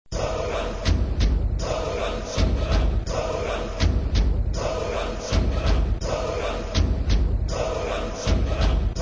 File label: music; STEAL THE STONES